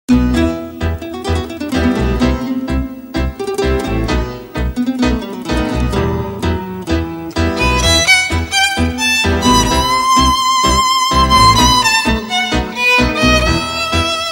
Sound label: tango ensemble